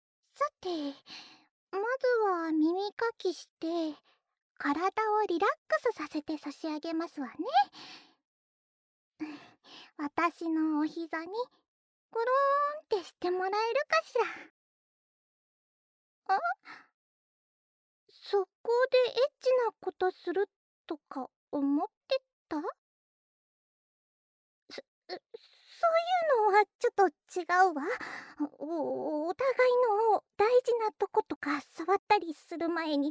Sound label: ASMR